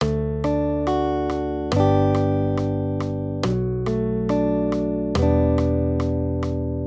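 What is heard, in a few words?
Guitar, Accompaniment, Major Triads, D-G, 140 BPM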